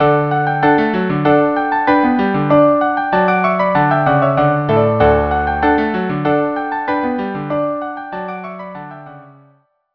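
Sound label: Tambourin; Princess of Navarre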